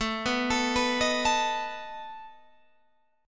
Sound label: A Suspended 2nd Flat 5th A down Guitar Open G